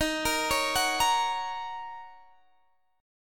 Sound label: D sharp Minor 7th, Guitar, DADGAD